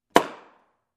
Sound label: Bursting balloon